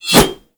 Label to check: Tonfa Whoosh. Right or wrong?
Right